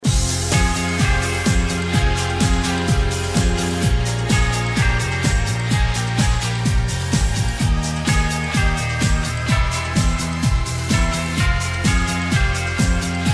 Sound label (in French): Accord, Quelques accords pour tomber d'accord